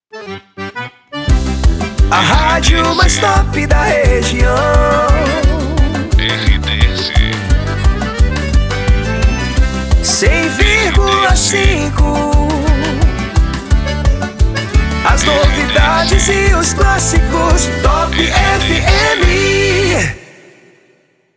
saída de bloco; Top FM

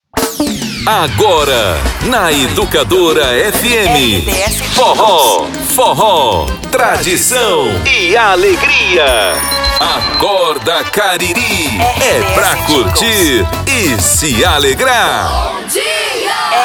abertura; ACORDA CARIRI

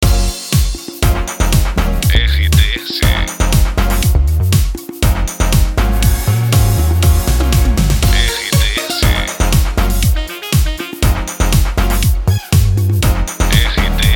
trilha para locução sucessos; Sucessos da Itapoan

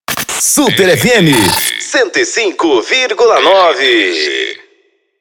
intercom

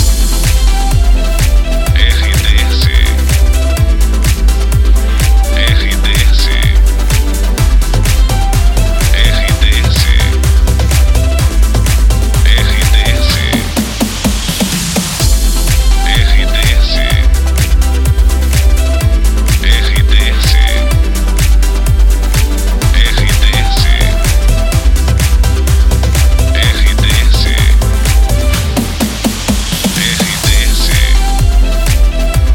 loop trilha; Glauber

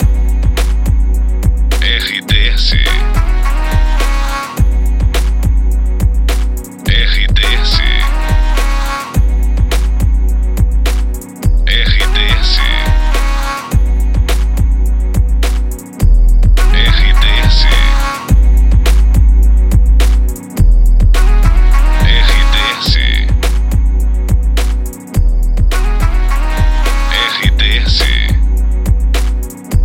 trilha para locução; Pacote Itapoan FM, Salvador/BA, uma emissora com característica jovem

